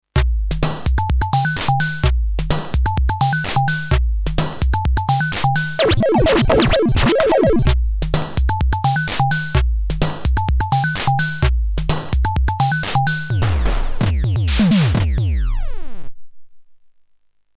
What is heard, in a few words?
DCM8 Pitch Decay pro Step